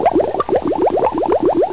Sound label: BUBBLER